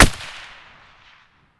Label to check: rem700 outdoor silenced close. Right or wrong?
Right